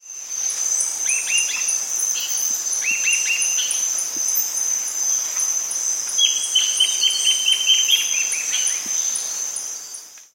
Guianan warbling antbird

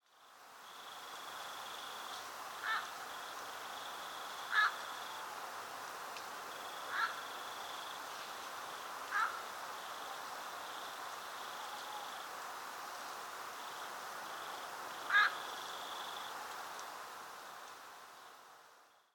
Little egret

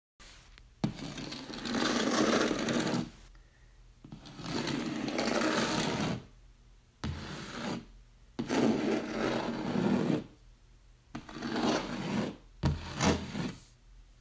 nails on wall; ناخن کشیدن روی دیوار

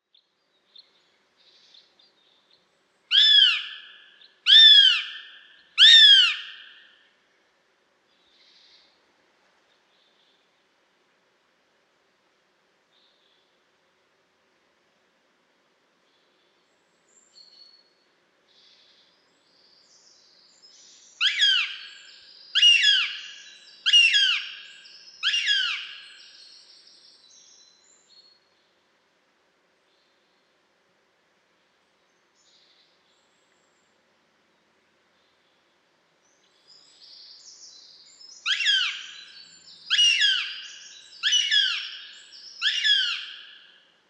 Eurasian goshawk

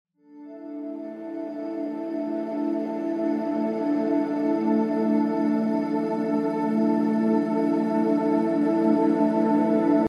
Beta waves

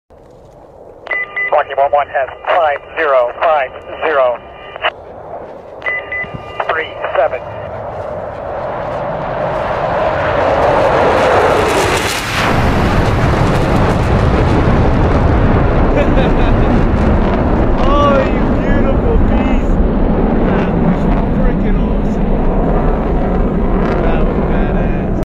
SR-71 Blackbird, هواپیما

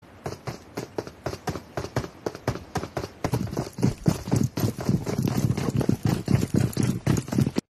Foot in pubg; پابجی

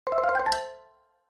Score points in game, امتیاز گرفتن در بازی

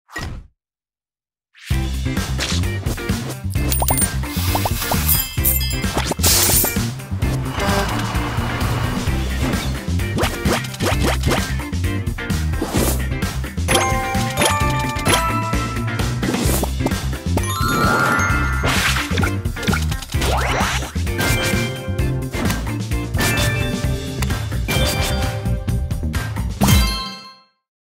Cooking game

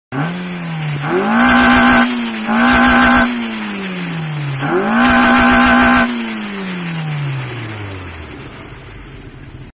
Ram air; کیت مکش

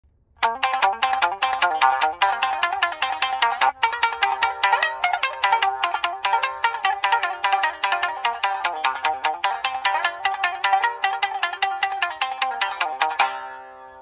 Shamisen; ساز ژاپنی

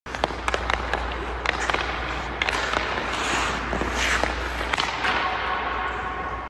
ice hockey; هاکی روی یخ

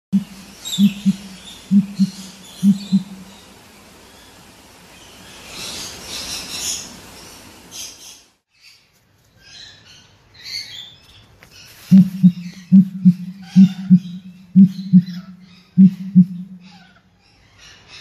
Western crowned pigeon; کبوتر تاج آبی

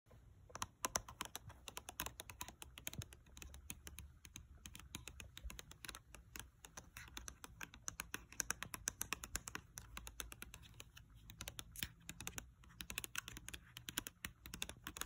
Nail on box; ناخن روی جعبه

